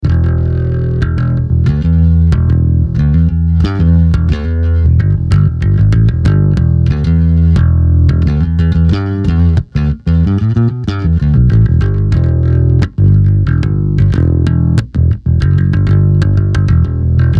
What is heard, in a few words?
Bass Compressor